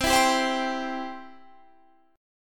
C Major, Guitar Standard; C strummed; 20,19,17,17,20,20

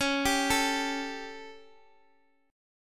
A 7th C sharp

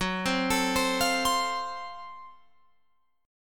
Gb Minor Major 7th Flat 5th Gb Guitar Open F; GbmM7b5 arpeggiated; 18,19,21,19,20,19

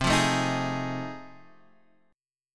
F 7th Sharp 9th C

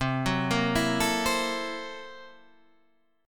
Gb 7th Sharp 9th Flat 5th C, guitar

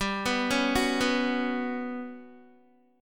G Major 7th Flat 5th, Guitar; Listen to GM7b5 arpeggiated

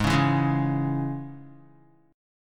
Ab Suspended 4th Sharp 5th, Guitar; strummed; guitar chord; 3,4,4,1,1,x; JGuitar

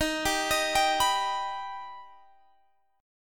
D sharp Augmented D sharp Guitar Standard; D#+ arpeggiated; x,18,17,20,20,19; JGuitar

